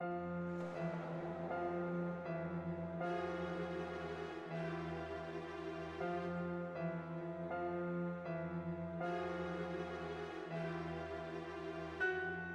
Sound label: Small Town Horror Theme Music